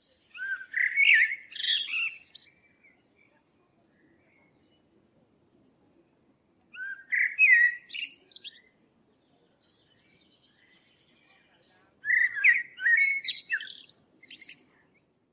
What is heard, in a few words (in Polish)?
kos, amsel; timo s.